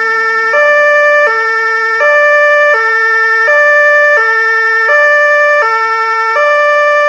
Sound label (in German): Polizeisirene Deutschland